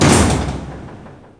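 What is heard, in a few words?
power door close; environment; doors; SINDEMO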